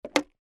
skateboard ollie

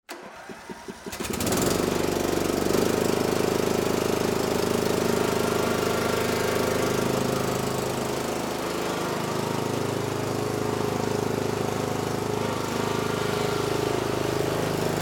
lawn mower

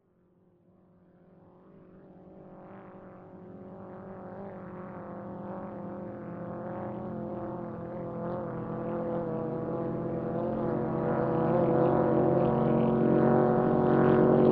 Boat Small Engine

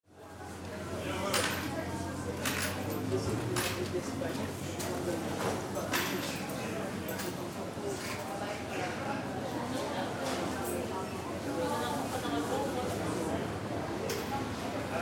foreign crowd

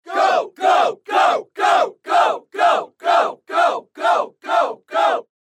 crowd go

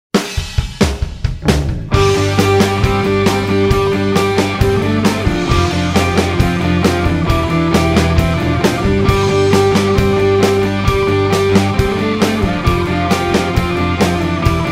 Brit Pop; Soundzabound Volume 5

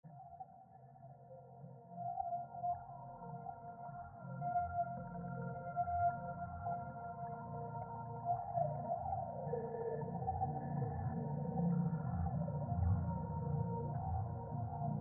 Drone Low

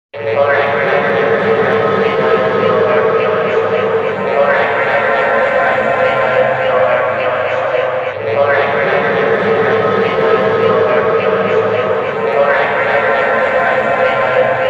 Futuristic; Soundzabound Volume 3 Disc 2

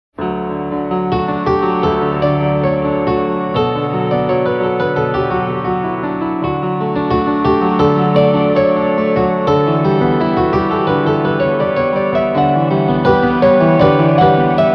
Perfect Piano; Soundzabound Volume 4 Disc 3

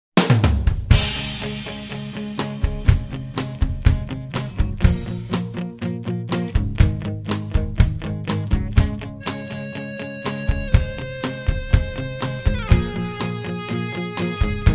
Nashville Rocks; Soundzabound

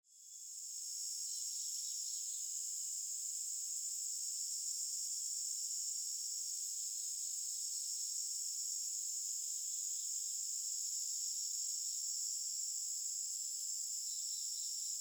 night woods

